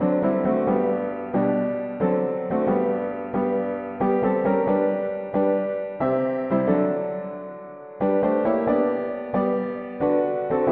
mono piano; hymns

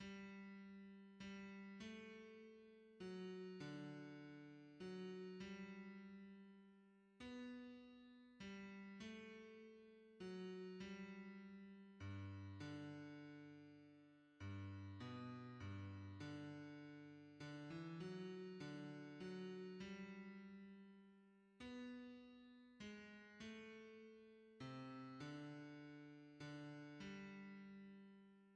hymns, bass

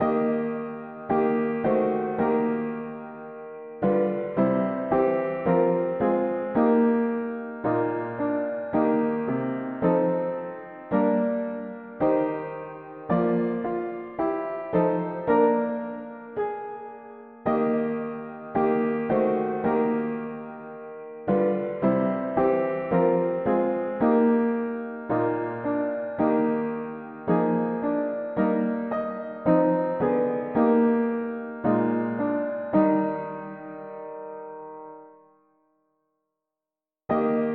mono-piano; Qui peut sonder tes profondes pensees; hymns

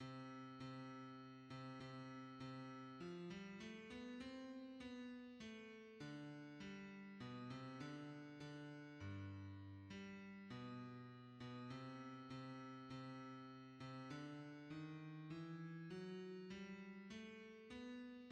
bass; hymns; Lord what is man Tis He who died

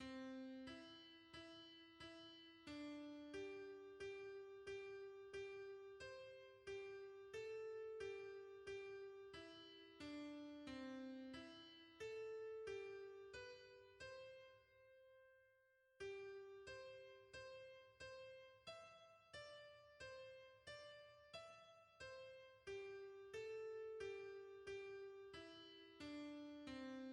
hymns, soprano; To wait for that appointed day

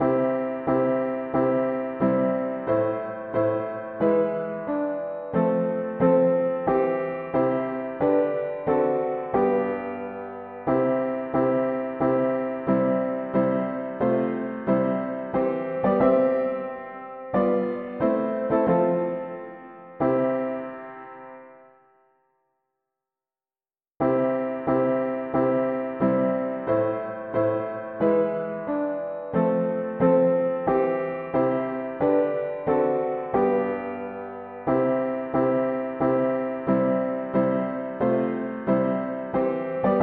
mono piano; hymns; Man of Sorrows what a name

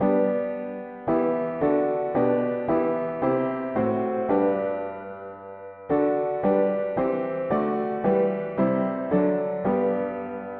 mono piano, hymns; Que vers Jesus monte en tout lieu

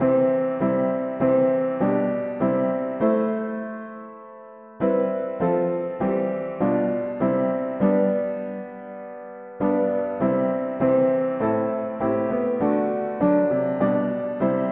Lord of the worlds above mono piano; hymns

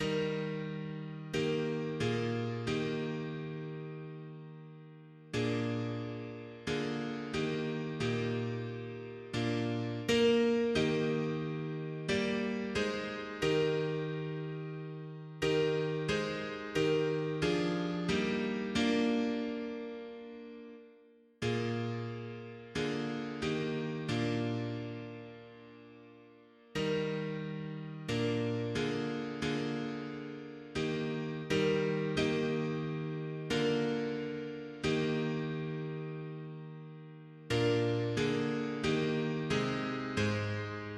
mono piano, hymns; Tandis qu au ciel

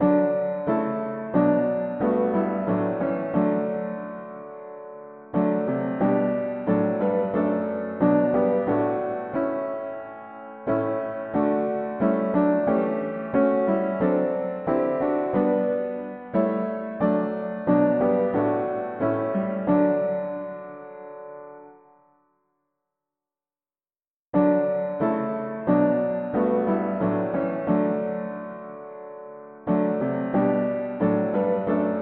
mono piano; hymns; The person of the Christ

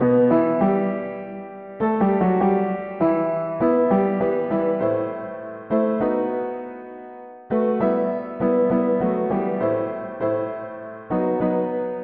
mono piano, hymns

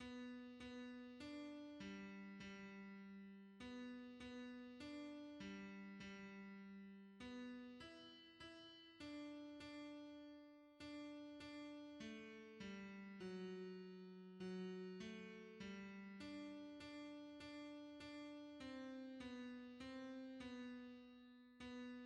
hymns, tenor; Ye servants of God your Master proclaim

